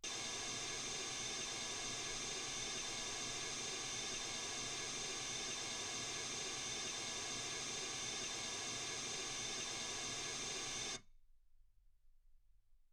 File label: mls16 48kHz